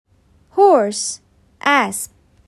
Horse

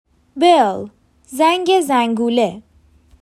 Bell

